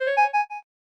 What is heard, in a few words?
clarinet; SKY IM-T100K VEGA No.5; keytone